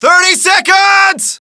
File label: lander; overlord